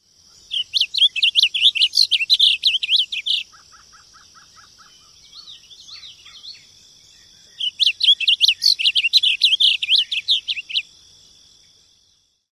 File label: song